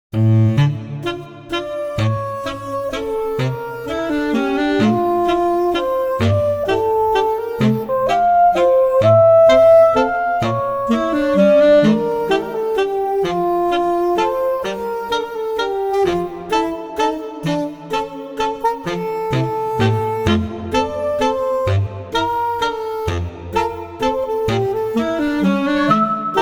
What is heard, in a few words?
Sax